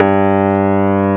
SUITC AMP G1, Keyboards Old School; USB Soundscan vol.09; AKAI

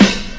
KIT, Dance Mega Drums, HIP; Best Service

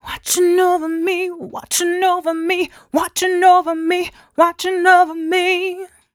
f 126 mel f-sharp, fem Vocals

